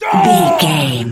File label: voice male battle shout short